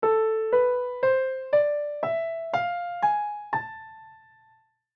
harmonisch Moll

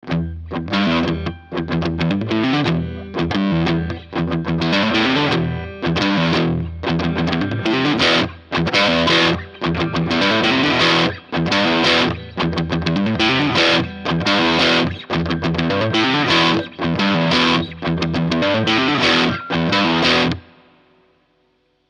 Ibanez MH 400 Neck Zerre

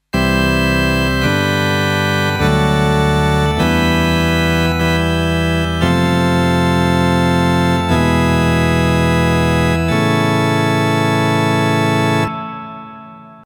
Sakral Sound

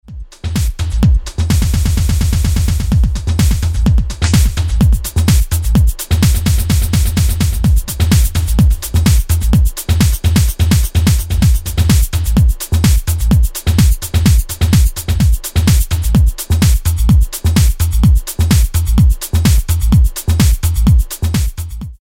Echo Loop diverse

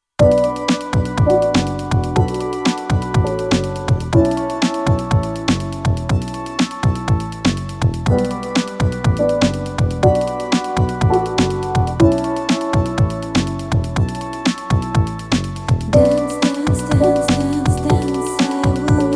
M8 Tracker Songbeispiel mit Gesang; Dirtywave

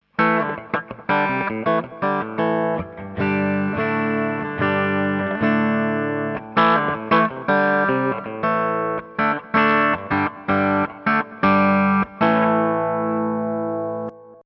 HB Steg

